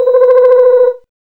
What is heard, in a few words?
SCI-FI